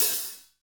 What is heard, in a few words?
hi-hat; Roland L-CDX-01